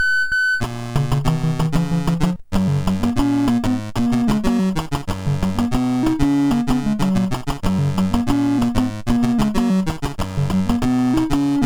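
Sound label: MOS 6581 SID chip music, games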